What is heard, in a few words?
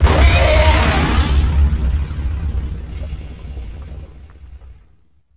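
combat explode tie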